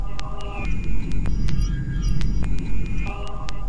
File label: ambient voxer; LOOPING AMBIENCE